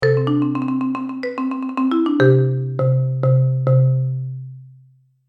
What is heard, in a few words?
CommandKit Marimba